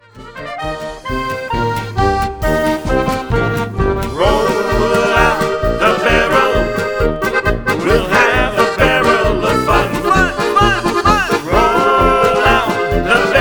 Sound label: Polka